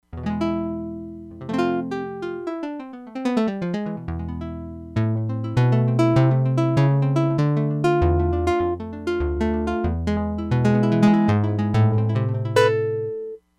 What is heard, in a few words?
ACOUSTIC GUITAR, Crumar Bit 99; Factory Programs